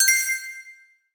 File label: Pickup Gem Bells; Pickups